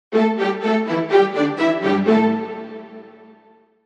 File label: strings step down; audio branding; klassisch